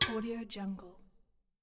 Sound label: small metal hit